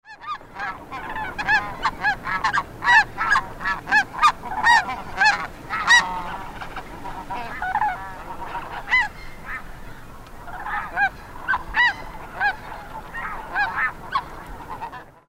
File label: snow ross geese